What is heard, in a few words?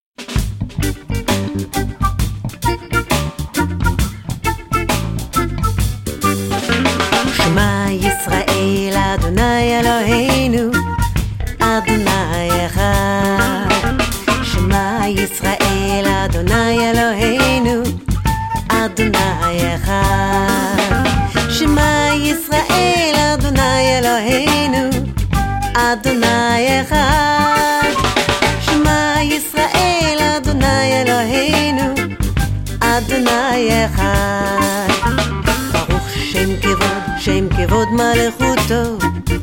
Jewish Reggae